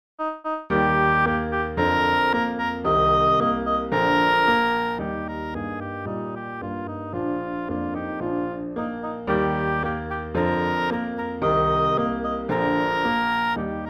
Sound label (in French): ecossaise; beethoven ludwig van